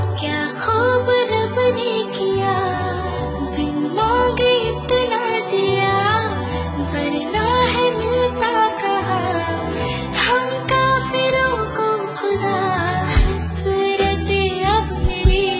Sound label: Romantic Love Song Ringtone